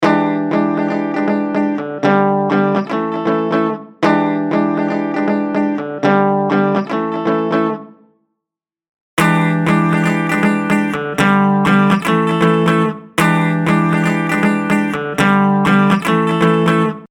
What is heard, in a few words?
Acoustic Sim